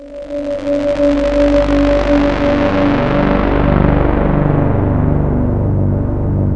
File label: Waldorf MW; Rubber Chicken Software Sample Bank